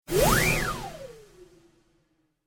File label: Whistle Whoosh